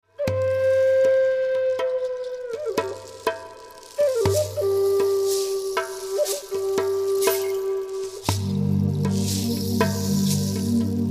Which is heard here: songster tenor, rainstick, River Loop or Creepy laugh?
rainstick